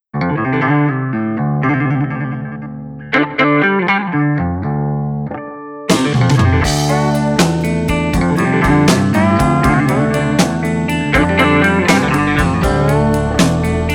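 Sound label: Blues Rock Mellow Instrumental 120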